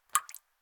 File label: raindrops; anemoi